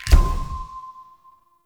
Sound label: UI Focus Rank Up Tree